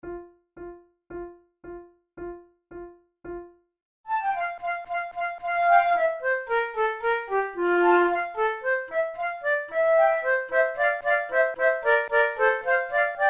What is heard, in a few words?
trio, flute; martini